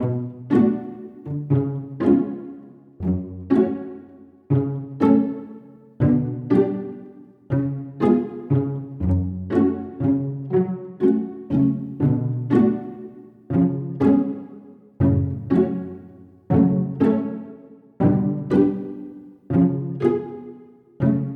cello pizz

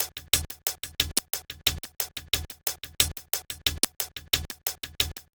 Record, chillout

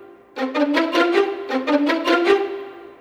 Spizzicato; ambient

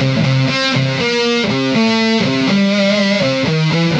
Lead A

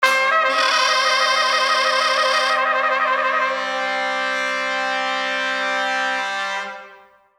Crrrazy; духовые

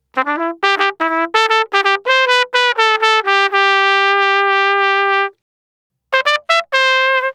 Trump Solo, brass

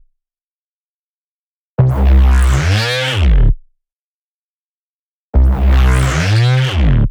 Frizzle

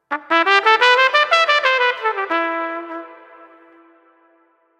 Trumpet Solo; Trumpet Solo j; brass

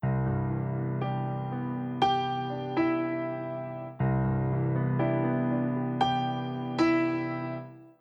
Arpeggio C; Jazz (Джаз)

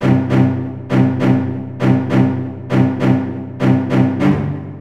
Epic, strings; рок поп; strings сэмплы

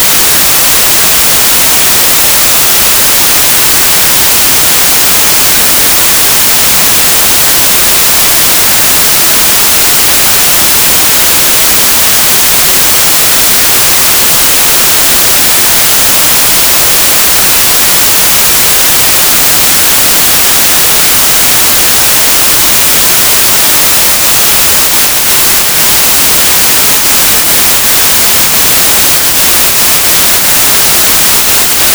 White Noise

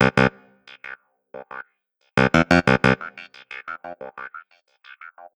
Clav Delay; keys

